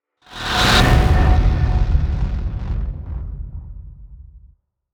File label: Skull Smash; Gothic Storm Music; Brain Warp (Sound Design Trailer Tools) 2011